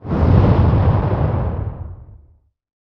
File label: woosh